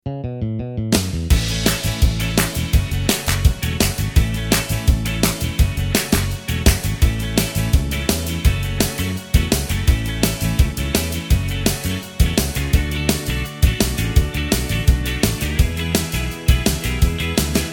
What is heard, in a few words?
(A) No Lead Guitar